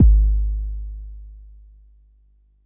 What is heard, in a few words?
Bassdrum; Jomox Xbase09